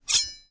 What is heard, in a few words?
spike trap